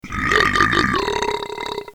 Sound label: brap